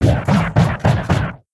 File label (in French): Cri